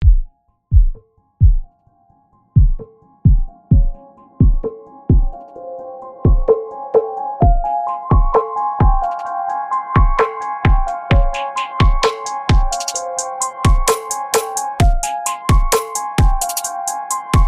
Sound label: Beat